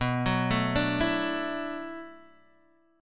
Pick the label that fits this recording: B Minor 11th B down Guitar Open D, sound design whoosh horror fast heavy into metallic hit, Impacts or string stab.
B Minor 11th B down Guitar Open D